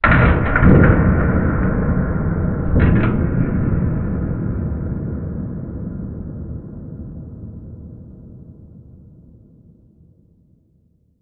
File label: big hit far